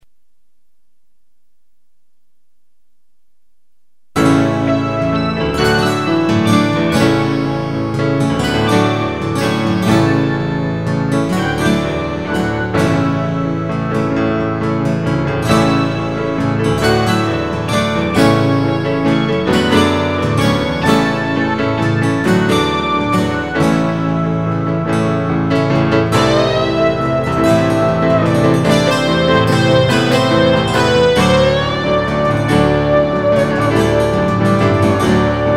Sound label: Guitar Wild